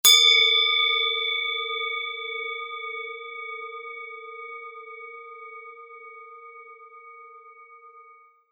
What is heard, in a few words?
japan rin bowl crash